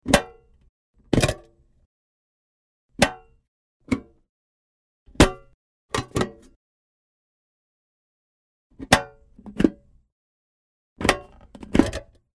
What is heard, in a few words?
can open